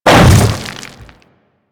Hammer Boss Step Dirt, footsteps; Warframe; Grineer

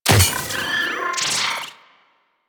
Drone Death Malfunction

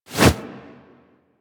Arm Swing Foley Heavy; Enemies; Warframe; Purgatory Warrior

